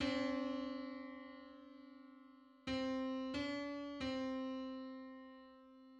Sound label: Five hundred fifty ninth harmonic on C